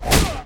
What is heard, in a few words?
pain blast; Apotheorasis